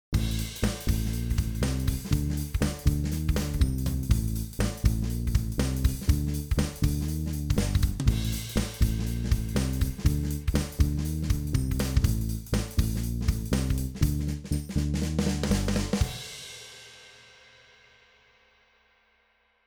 Electric Bass; Roland JU-06; SoundDiviner Project; Roland JU-06 Pack03; digid bass pack

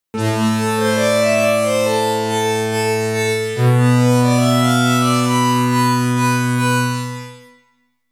String Synth

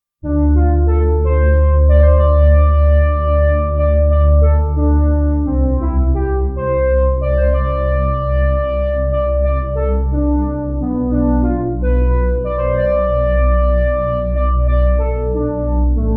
Deep String

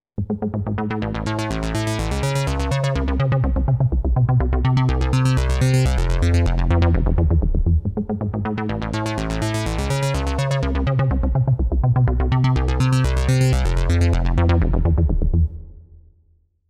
Velocity Arp

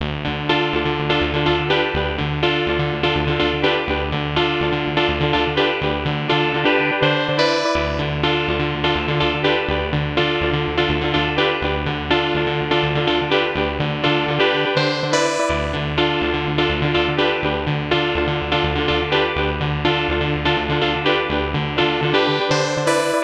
Special Pluck

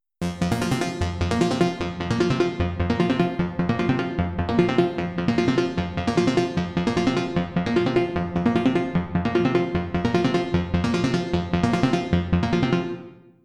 Blip

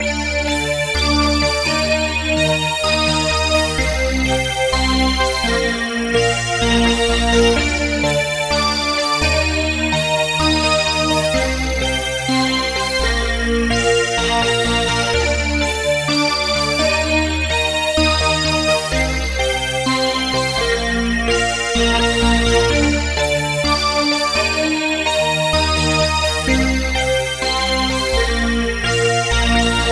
Reso Pads

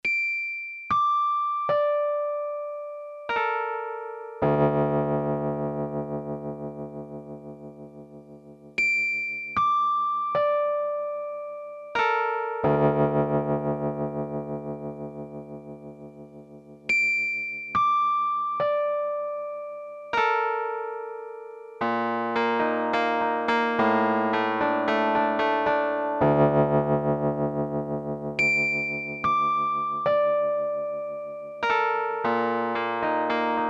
V-Piano

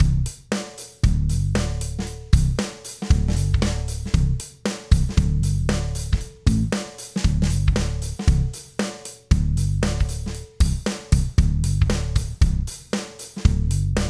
Bass Drums

